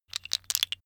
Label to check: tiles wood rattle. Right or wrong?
Right